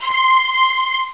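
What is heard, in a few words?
orchestra C5; Alone In The Dark Demo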